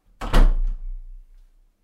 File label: Foley